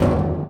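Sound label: panels impact small, metal; physics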